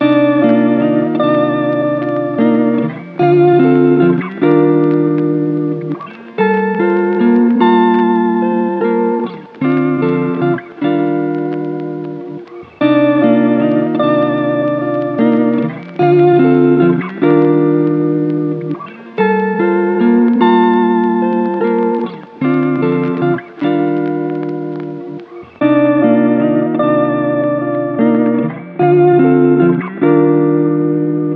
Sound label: 75 BPM G Min Guitar; Melody Loops; Pistachio; Cymatics; ANALOG EVOLUTION Beta; Lofi Collection